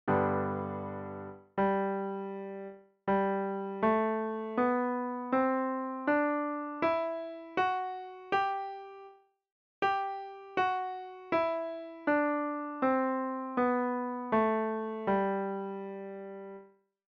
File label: scale